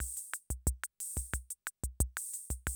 CR78, DrumLoops

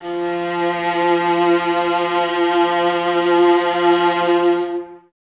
violas F 3, String Ensembles, Exprsive; Miroslav Vitous

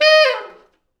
T Sax falls, Horns Sax

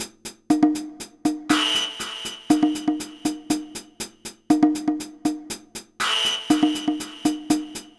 120 Perc; Zero-G; Soundclash Nu Dance Electro; Combi Loops; 120bpm; Agoraphonica

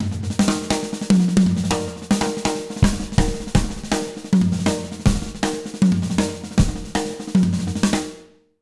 BEAT, Jazz Latin Drumloops

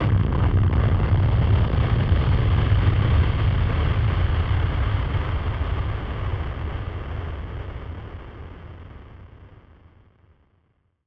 Drum Bomb; musicradar; bomb factory; Drum Bombs

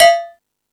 Percussion, Drum Machines E-MU Pro-Cussion

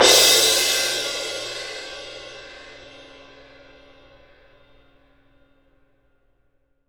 CYM FF, Percussion; ILIO; Synclavier Percussion 2; World & Orchestral

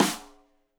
Rim Shot Stereo; Deeply Sampled Drums; SMDrum

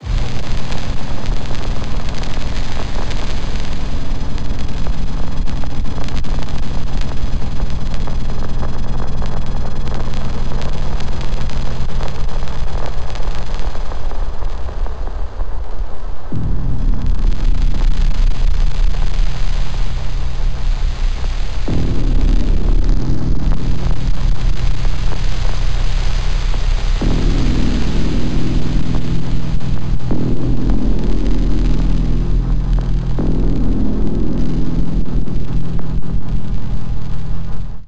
texture, drone pad texture; Polyend; ARC Noise

